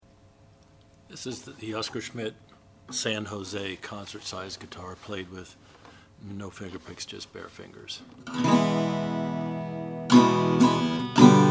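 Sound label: oscar schmidt san jose natural, Guitars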